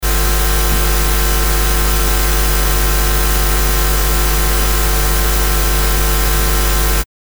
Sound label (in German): usb soundkartte mt mikrostecker, hochfrequentes fiepen